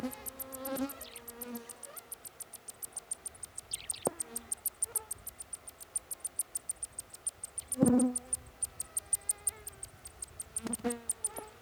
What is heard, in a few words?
Lasiopodomys brandtii alarm; Brandt's vole; Natural series of alarm calls of one individual, mobbing the researcher; Series of discomfort calls of a male adult